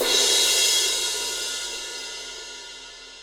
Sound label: Crash